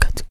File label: HIT, Jazz FX FEM PERC